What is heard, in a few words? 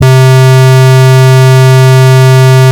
square; emu workshop